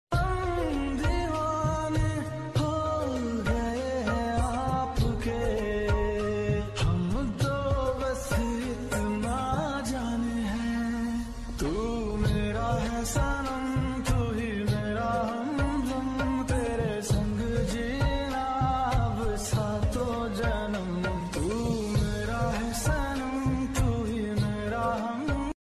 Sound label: bollywood ringtones